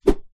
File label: foley wood pole thin swing air swoosh; zapsplat